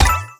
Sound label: collect; pinball